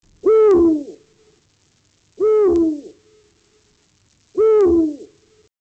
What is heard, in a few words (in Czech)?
zvuk, ptaci